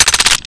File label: k98 load